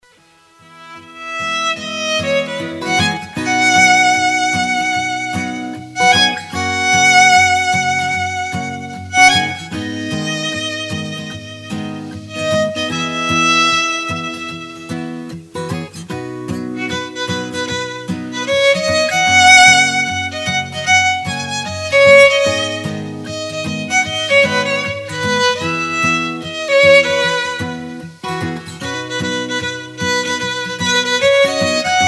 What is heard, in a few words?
violin guitar